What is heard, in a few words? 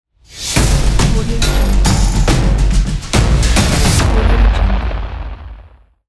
cinematic trailer intro